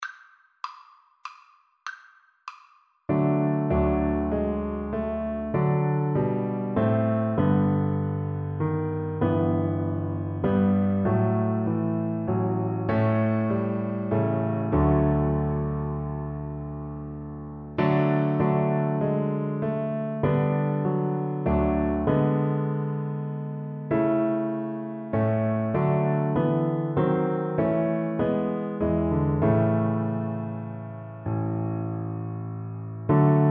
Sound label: Double Bass